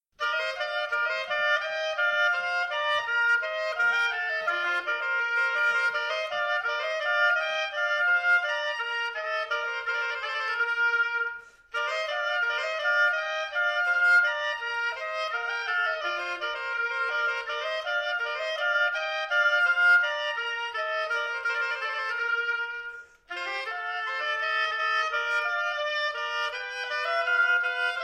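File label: bourree 2 oboes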